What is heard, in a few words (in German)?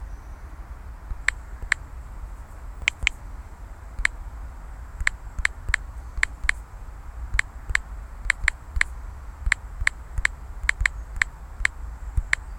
buntspecht; waldnasen geraeuschesafari